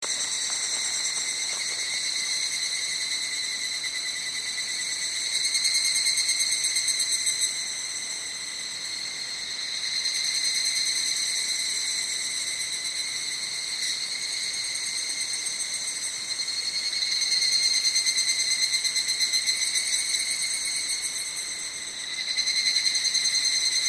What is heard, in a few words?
higurashi